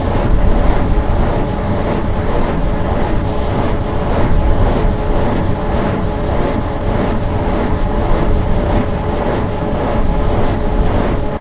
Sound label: wind turbine